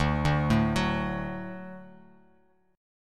D Major D down Bass Standard; D arpeggiated; JGuitar

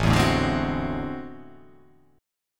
A Minor Major 13th, down

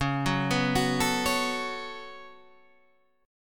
C sharp 7th Suspended 4th Sharp 5th, C sharp

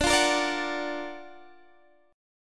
Gb Augmented Major 7th D

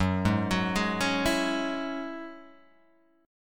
F sharp 7th Suspended 2nd, Guitar, Open G

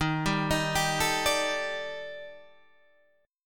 Eb Major Sharp 11th Eb Guitar Half Step Down; EbM#11

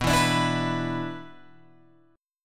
B-Minor Double Flat 5th, Guitar Open G

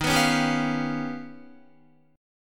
Eb Augmented 9th Eb down Guitar; Eb+9 strummed; JGuitar

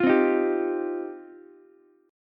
G 7th Suspended 4th Sharp 5th, Ukulele; G7sus4#5 strummed

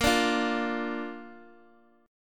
A sharp Major A sharp down Guitar DADGAD, chord

